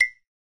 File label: blink